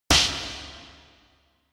Hit Slap, Reverb; 打撃 平手打ち; リバーブ

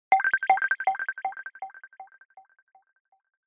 Accent

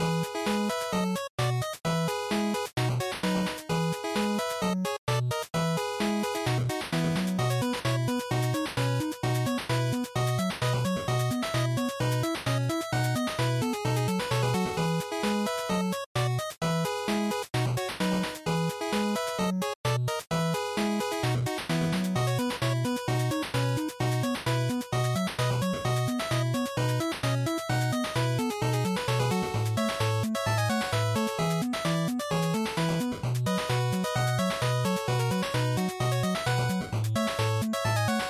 NES Action Stage Loop 130

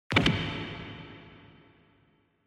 Fracture Reverb

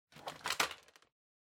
Vacuum Cleaner Head Pick Up; 掃除機03-40(ヘッド 持ち上げる)

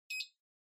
Ceiling Light Beep

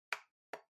Trackpad Click Far L

